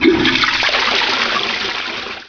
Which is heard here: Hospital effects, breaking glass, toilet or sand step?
toilet